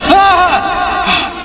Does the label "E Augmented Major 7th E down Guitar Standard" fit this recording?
No